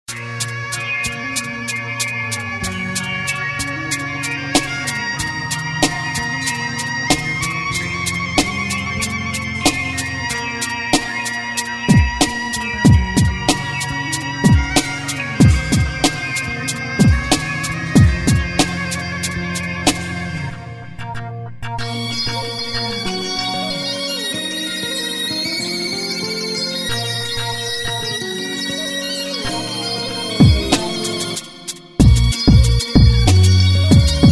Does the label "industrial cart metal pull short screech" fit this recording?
No